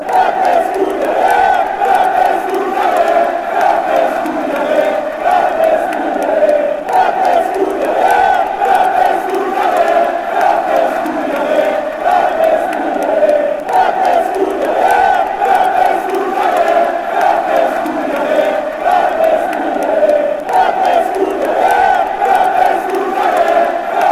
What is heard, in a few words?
ale, fan chants